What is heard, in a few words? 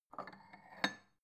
bowl take soft